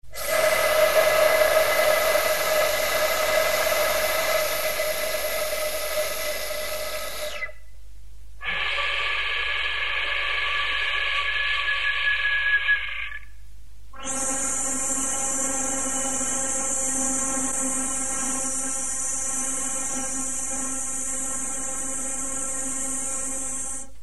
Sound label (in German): rauschen